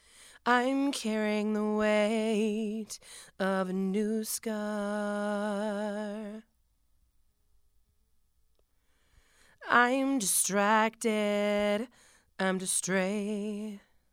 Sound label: Female Vocals